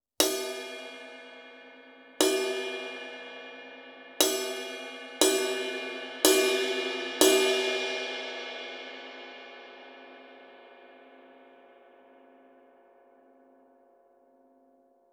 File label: 20 Thin High Bell, 20" Kerope Thin High Ride; ride cymbal